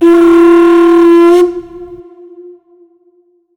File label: Concheror horn; Conch Shell Call; Soldier